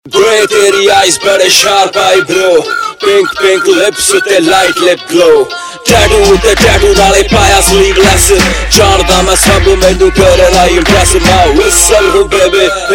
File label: Whistle Ringtone, Punjabi